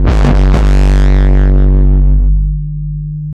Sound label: BD Distortion, Bassdrums; Vengeance Samples